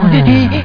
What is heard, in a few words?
ahhhh; AVX demo